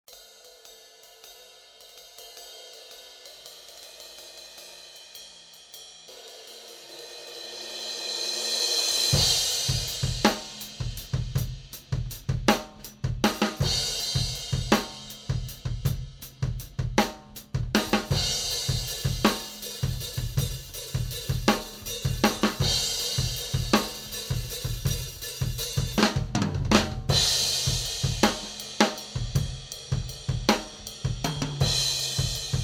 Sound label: Drum Overheads 421; Sennheiser 421; Dynamic